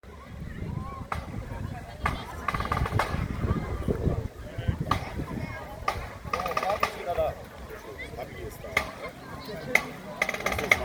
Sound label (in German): Marsch